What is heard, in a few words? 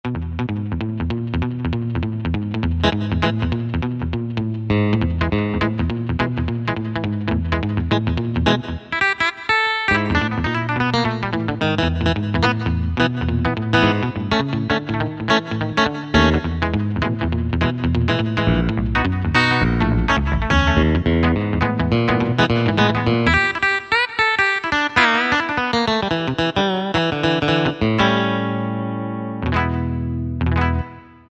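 Electric Guitar, Yamaha PSR-3000; Factory Voices; Guitar & Bass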